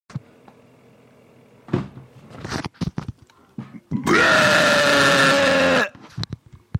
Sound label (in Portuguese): arroto